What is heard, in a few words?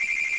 Milling Wall; Future